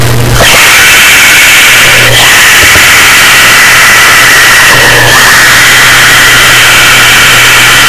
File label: packing loud